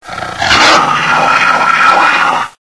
melee; hl2; zassassin

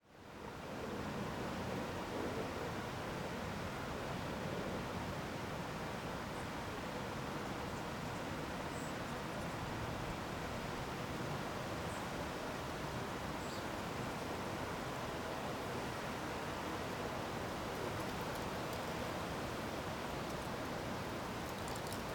City Birds In Park, Voices, Distant Traffic; B (wxyz); Ambisonic; 2496SoundEffects

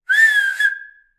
Whistle Finger Moderate; InspectorJ; 44.1 General Library

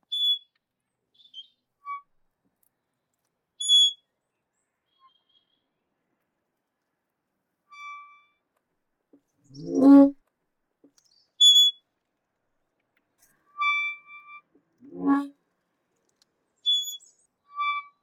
Wooden Metal Hinge Creaks, Squeaky Gates; DOORGate; Jake Fielding

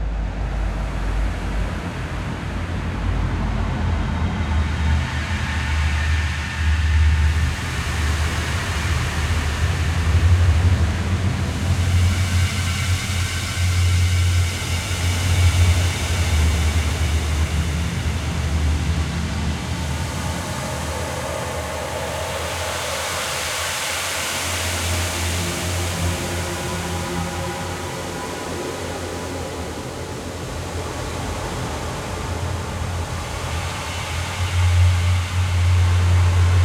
AMBIENCE ELEMENTAL EXHILATION LOOP, drone; Shapeforms; Dystopia

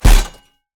Plate Impact Hard, Medieval Armor; Double Trouble Audio; Medieval Armor and Impacts

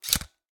Camera Flash Open; Bart Kamski; Clicks & Switches

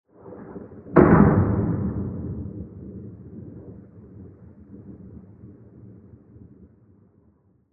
Heavy Sci-Fi Hit; Hits; Mechanical Wave

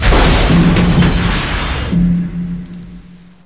distant drop, metal; hl2; impact

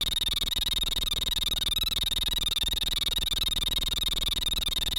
Analogue Synth Tone, Varispeed Data, Movement; 344 Audio; Data Processing

